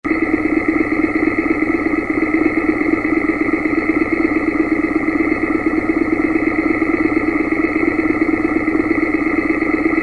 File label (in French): distrib, moto r1150gs